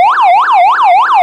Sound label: yelp, sirens; code3 rls